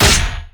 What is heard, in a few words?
basicattack human; LoL; shyvana